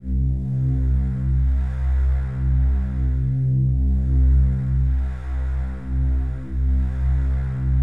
CHRD PAD; Best Service; XX Large Pads; Sample CDs

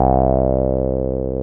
303 HLLW C2, ROLAND TB303; Big Fish Audio; First Call Vintage Keyboards

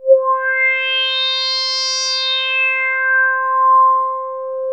TS10 Analog, Synthesizers; Soli-Music; World of Synthesizers VOL-1

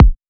Kick Chunky

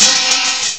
OPEN HAT; Greytsounds VOL-1

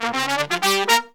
Pop & Funk Brass

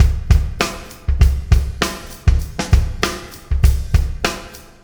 Landing Party Beat, Processed Drumkits

